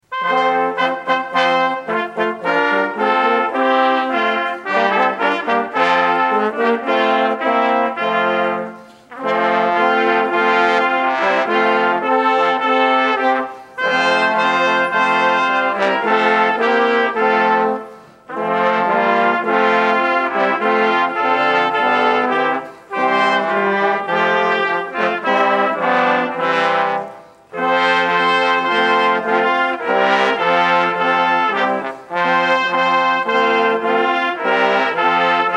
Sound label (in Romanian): Grup instrumental